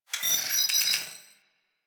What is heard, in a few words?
Side Pillar Rand, Small Cargo Room; Warframe; Ambience